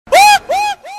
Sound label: wooo